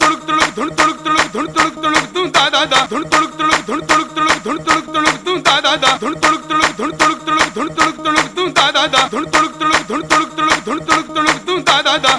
boombox; jailbreak

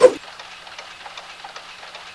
knife slash; spknife

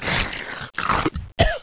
smoker idle, zombie idle

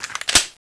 sterling bayonet clip in, weapons

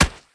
knife hit

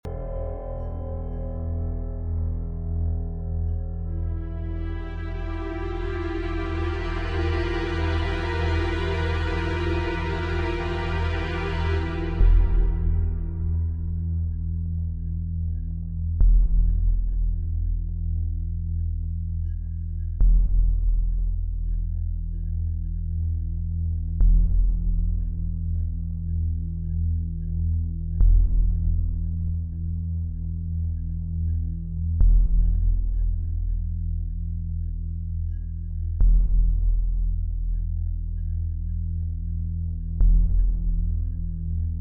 music

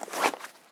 c4 draw Kit

